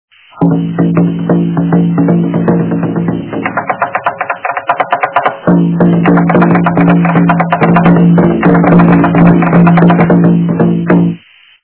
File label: bongos and sticks